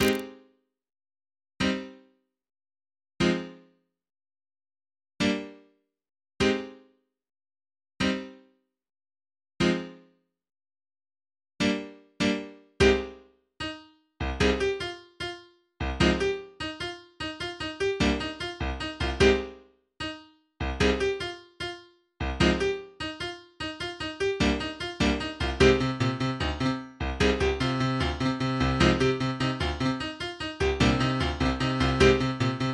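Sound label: SAD, MIDI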